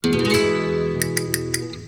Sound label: Castanets